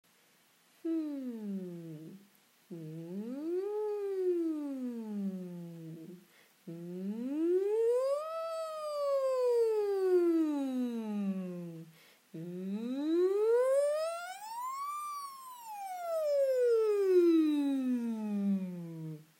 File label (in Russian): Упражнение для голоса на глиссандо